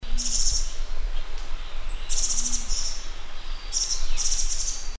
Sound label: Taiwan Barwing